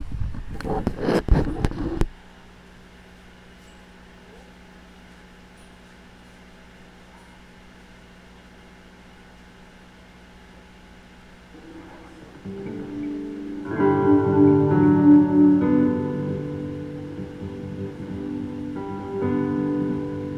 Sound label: raves